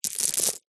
foley pebbles many small drop low height soft surface